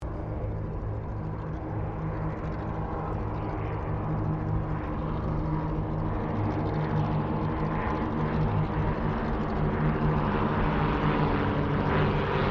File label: AIRCRAFT CT4A SQUADRON PASS, military trainer aircraft; Airplanes; Vehicles